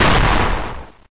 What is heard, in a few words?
down; Monster girl quest Part1,2,3; Visual Novels